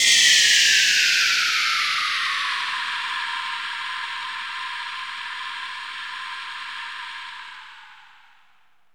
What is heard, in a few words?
SYNTH FALL; AMG - Vince Clarke Lucky Bastard